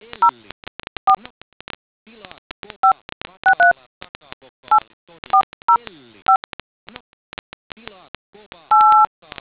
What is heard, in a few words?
dtmf